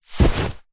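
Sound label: clothing denim jacket fling over shoulder; foley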